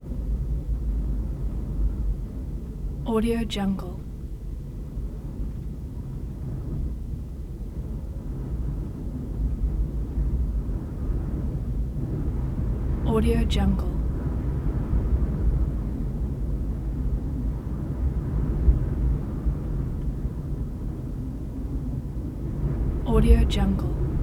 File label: wind strong gusty constant